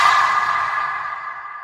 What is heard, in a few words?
skill check; dbd